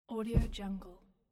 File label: putting hard pillow on couch